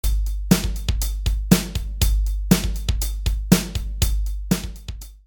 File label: pattern, guitars